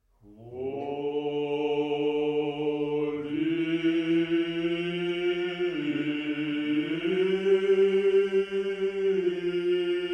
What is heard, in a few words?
communion hymn; liturgy